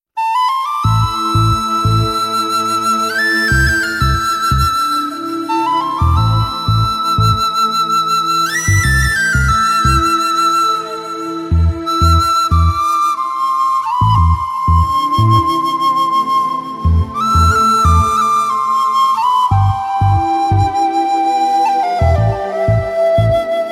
Flute Theme; Dasami; Telugu; Dashami (2012)

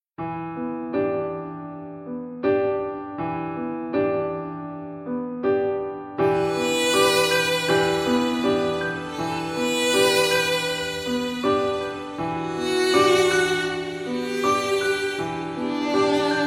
(Female), Telugu; O Manasa (2012)

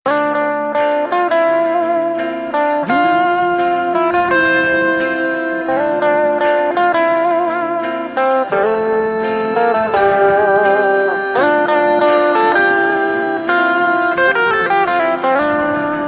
Male D-Plugged; Telugu; Chemistry (2013)

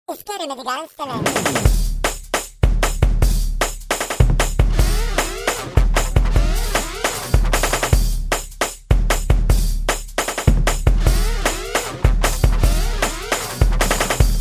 Karaoke Version; Tamil; Uppu Karuvadu (2015)

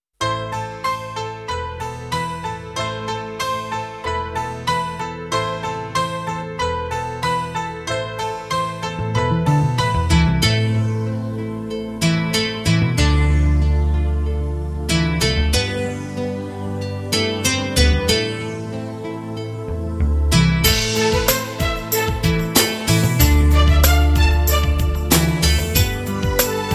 Guitar Theme, Freak Out Style; Telugu; Mahesh Babu; Yuvaraju - (2000)

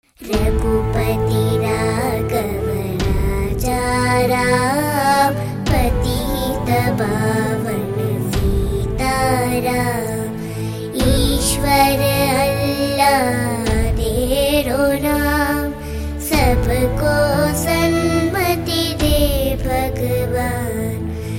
Female; Telugu; Oka Hindu Oka Muslim (2013)

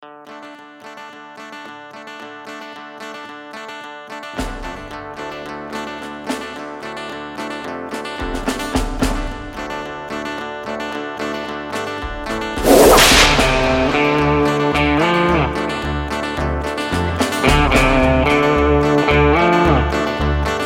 Instrumental; Tamil; Andhra Mess (2015)

